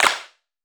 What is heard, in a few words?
CLAP, HAND CLAP; AKAI S6000 CD-ROM - Volume 5